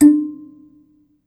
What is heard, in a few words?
BASS KALI, Kalimbas; E-MU Producer Series Vol. 7 – Old World Instruments